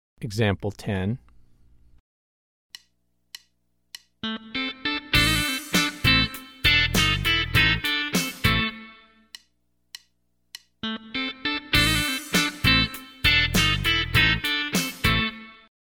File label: Country Rock